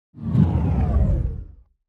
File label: Air Lock Close Pitch, doors high tech